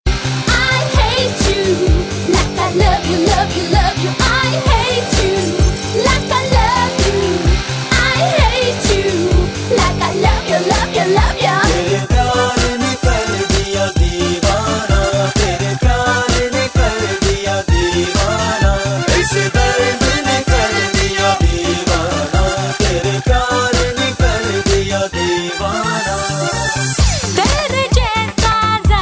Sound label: Item Song; Aamir